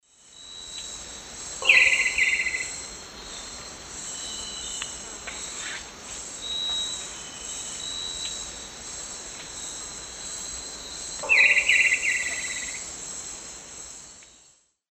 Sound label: Neoctantes niger, alarm call; S. America; Eastern Lowlands